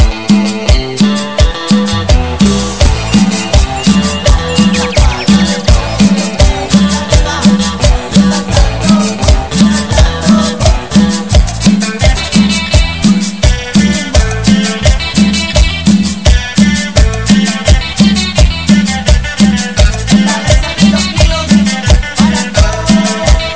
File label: cumbia; deathrun taringacs Lasers; cstrike